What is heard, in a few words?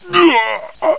die; svencoop; hunger; hungerbarney